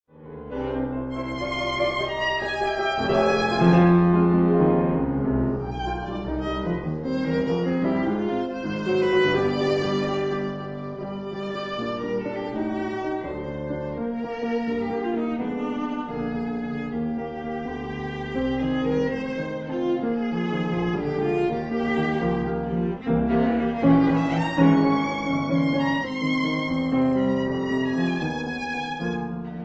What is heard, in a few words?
Romanzen For Viola Piano Andante con moto; Kiel; Op 69